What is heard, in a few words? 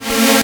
Trance Sweeps; Vengeance Samples; VEC2 FX